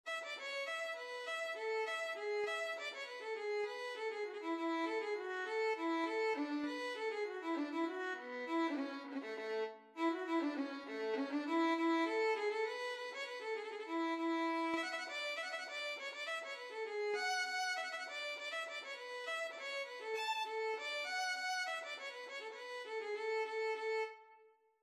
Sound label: violin; Miss Murphy